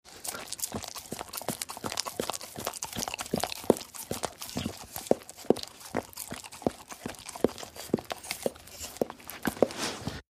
Dog Licking Spoon; mvmt

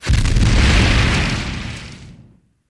Flame Burst Rumble, flamethrower

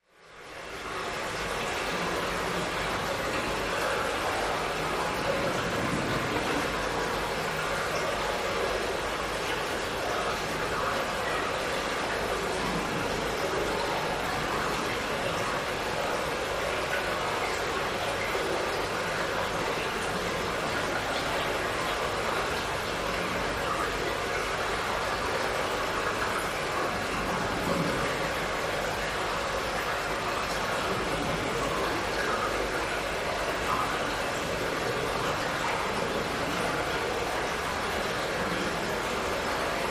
Sewerage Amb More Wate, sewerage

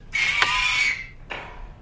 Spring Gate Squeak

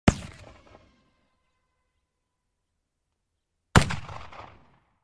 Musket Single Fire

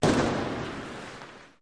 Ice Hockey Hits Impac

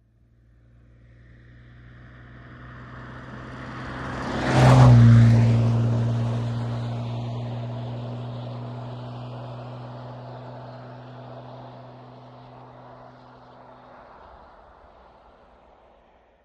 VEHICLES ASTON MARTIN: EXT: Pass, medium.

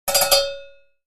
Cow Bell Jangle Quic

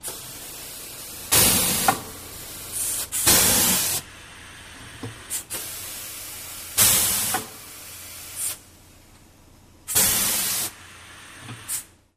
Cleaners Steam Press, air

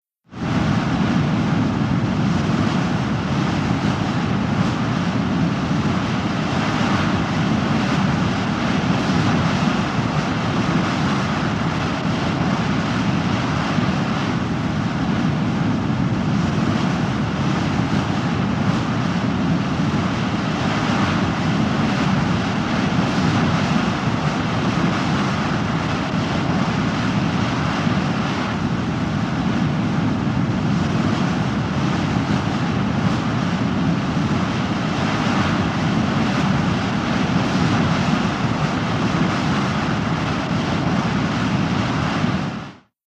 Ocean Liner Bow Wash D, ships

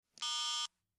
Cell Phone Asterisks

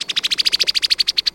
Brown Headed Cowbird

